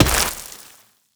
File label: dirt impact bullet; physics; surfaces